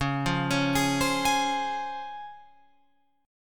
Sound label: C sharp Major 7th C sharp Guitar Open F; C#M7; arpeggiated